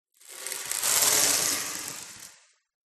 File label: household hairbrush bristles plastic scrape wood; zapsplat